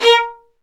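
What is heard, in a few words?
Violins Marcato; Roland LCDP13 String Sections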